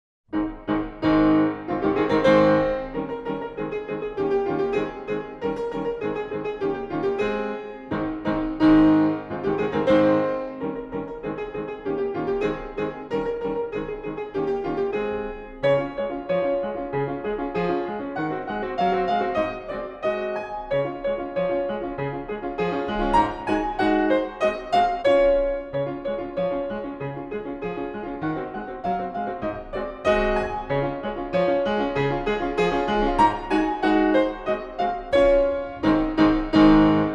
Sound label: Galop Marquis in A flat major, Piano; Chopin